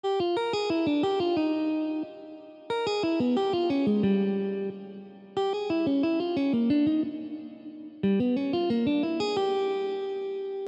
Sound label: minor 2 5 1